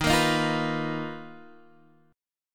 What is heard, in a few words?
B Major Sharp 11th D sharp